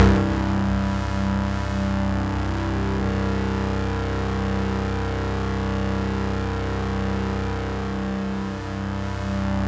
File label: C2, Synth Line; 90 s Sample CDs; NiceBeats; SynthLine VOL-1